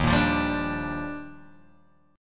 D sharp Major 9th, Guitar Open G; strummed

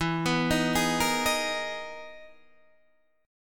E Major Sharp 11th E, Guitar, Open D; chord shape

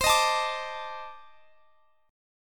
C Minor 6th Add 9th Guitar Standard; strummed; JGuitar

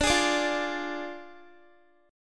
D Diminished D, Guitar; Ddim strummed; x,17,18,19,17,x

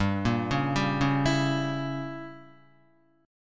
G Diminished 7th chord, down, Guitar; arpeggiated; JGuitar

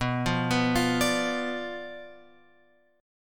B Diminished B; Bdim Chord {7 8 9 10 X 10} arpeggiated

